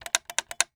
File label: Telephone Rotary Check for Dial Tone; Communications